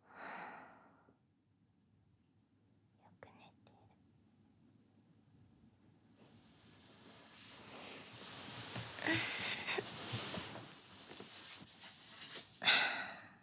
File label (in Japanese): 添い寝